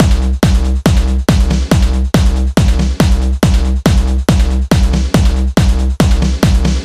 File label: Womp, 140bpm